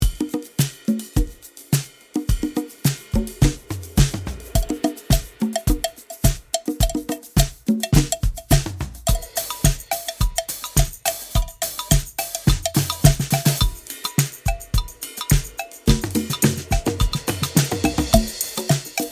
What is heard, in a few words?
Modern Disco; ALiveLoops; ModernDisco BUNDLE